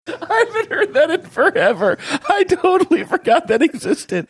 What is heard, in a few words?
laughing saying i haven't heard that in forever